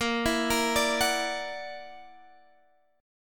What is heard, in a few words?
F sharp Augmented 7th A sharp, chord